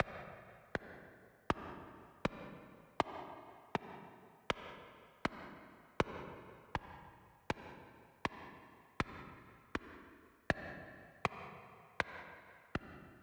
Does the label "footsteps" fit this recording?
Yes